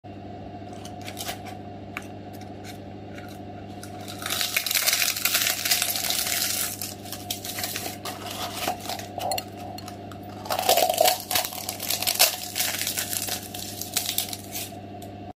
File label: egg shell